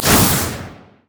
shadow swing; LoL; zed stormninja

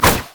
satchelcharge; Ziggs

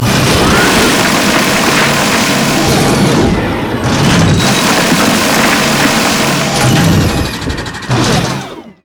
rocksaw; LoL; emitters map8; odin

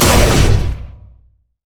passive shot; LoL; lucian

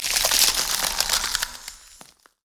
ring of frost; LoL; lissandra

